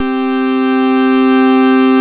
QUINTEN C4, SYNTHS; SE-1

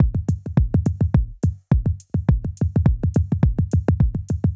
105 Loose Grooves, Percussion; Touch Loops; ElementsOne; AbstractRhythms

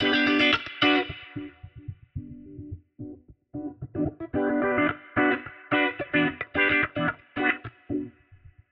110 E Riddems, Spaced Out Guitars; Loops; Touch Loops; CosmicFunk

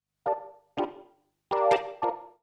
ORGAN 96 G; old school

